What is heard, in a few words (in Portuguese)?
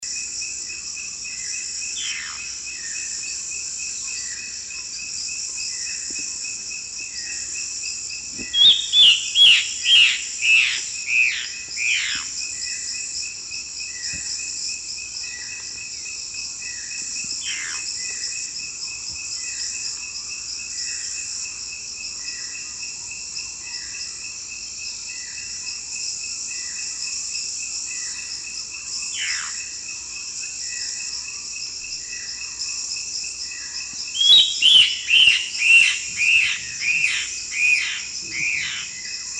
canto da mae de taoca avermelhada